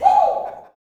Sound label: "Woo", Vocal Gospel yell shout